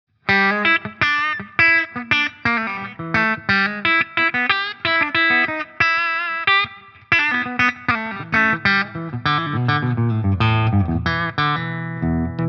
PRS Gain; Horsemeat

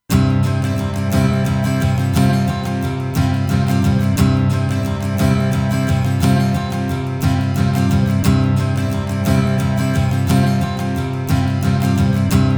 Guitar 50 50; Universal Audio 710 Twin Finity

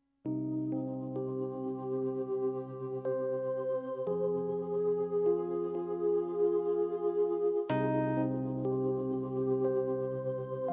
Ambient Pads

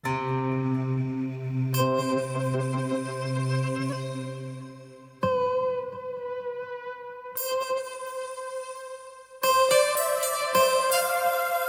Guitar Pad

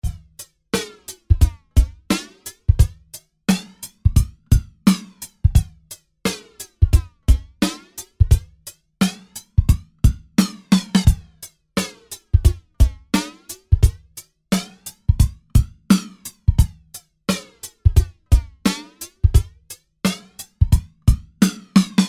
Flanger Drums

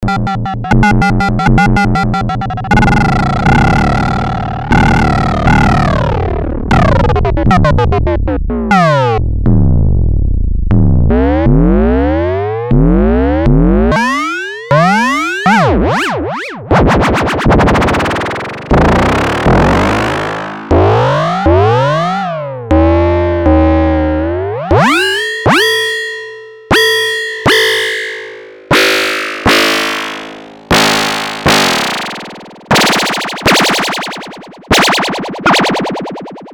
VCO Mode B LFO Sweep

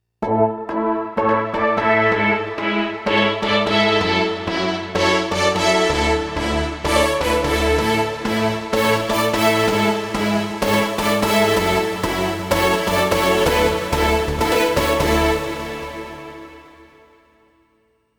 MODX Filter Fanfare AWMFM

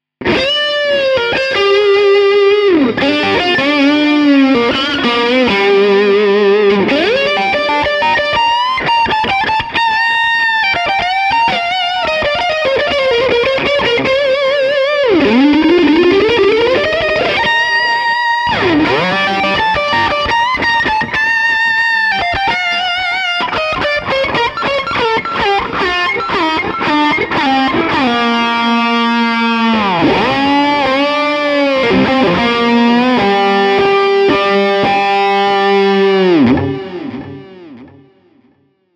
Engl Fireball 25 Lead

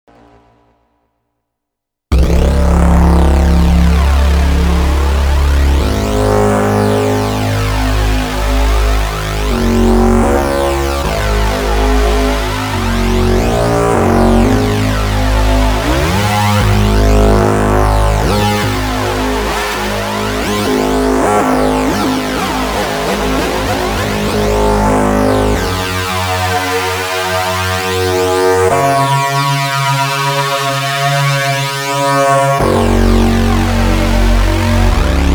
flanged Ringmod

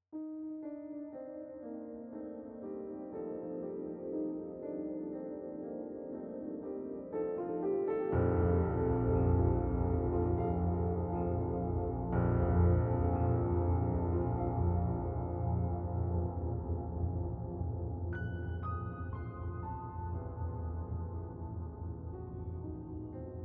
Mini Grand; Redline; Wispering Gallery

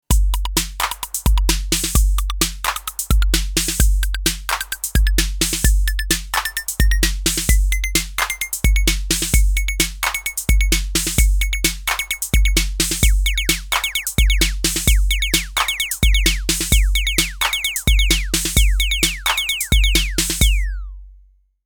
Roland TR 08 twin Cussion Mix In

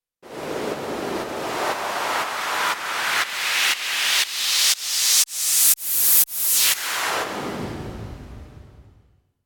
Pump Noise; KOPRO+

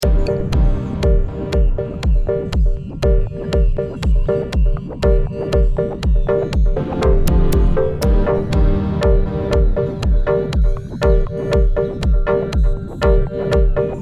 Electronic

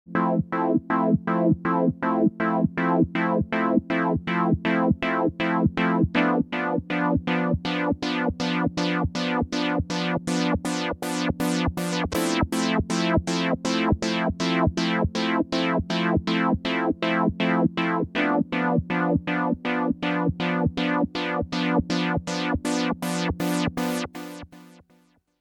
Mayer MD900 VA LFO Sync

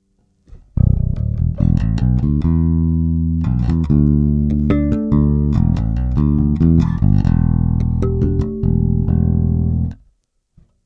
Jbass Fingerstyle Treble Boost; HB Block 800b

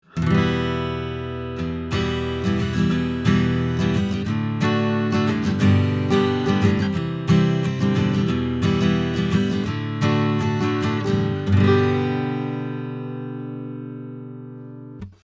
Beyer M88 AGuitar 20cm 15th Fret

